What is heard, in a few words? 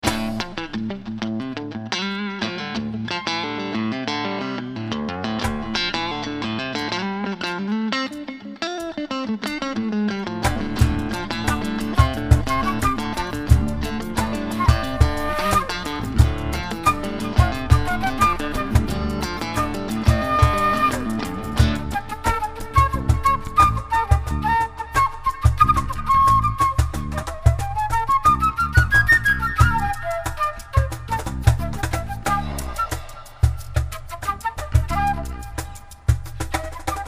Flute Live; Muenchen